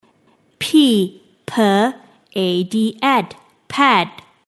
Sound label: pad